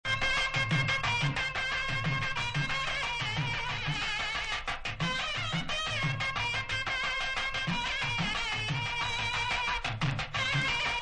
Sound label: sitar; Stones Throw